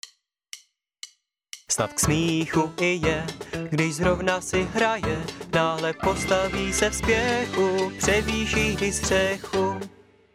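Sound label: Male Czech sing mix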